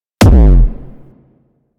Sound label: Briefcase Launcher Punch; Warframe; Weapons; Corpus